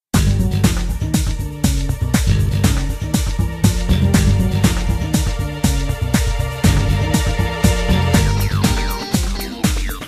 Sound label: Victory Theme; Persona 3